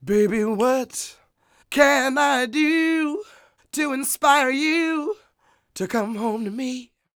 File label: SING, Vocal Planet Blues Soul BLUESY